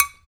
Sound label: PRC METAL, Africa; Roland LCDP14 Africa VOL-2